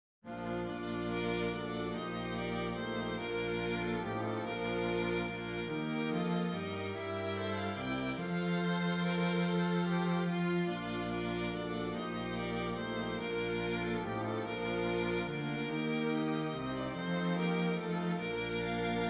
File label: Hymn Strings